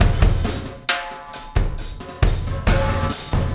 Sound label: Impact Crater Beat, Processed Drumkits